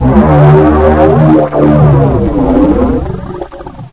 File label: die, panther; custom monsters